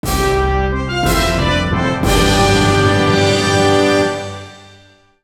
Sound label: Unknown Jingle; Wii Sports Club